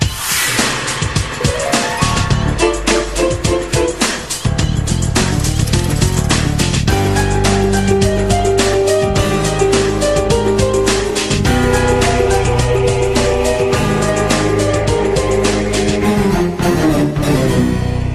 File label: Loop